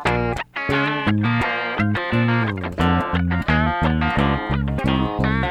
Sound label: instrumental